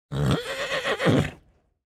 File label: horse pair vehicle ignition